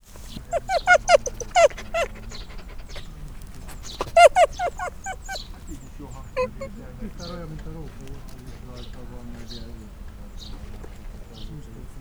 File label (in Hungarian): pillanatfelvétel, aranysakál; szegedi zoo 2011